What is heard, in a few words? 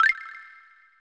Lose Oxygen; Banjo Kazooie